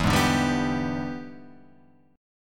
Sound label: E 7th Sharp 9th, Guitar, Open F; strummed; chord; 4,3,2,2,2,2